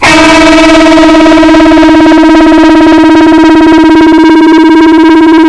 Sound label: Scary Chord 8th 5th